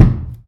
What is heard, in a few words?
bathtub step; Golden Crayon Sounds